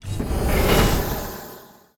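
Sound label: trap gas attack; monster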